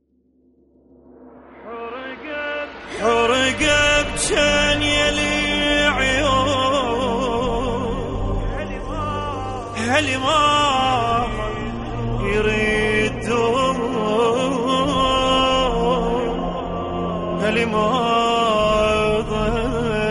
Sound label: nawha arabic